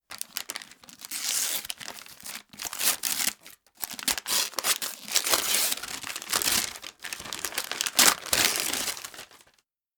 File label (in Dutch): cadeaupapier scheuren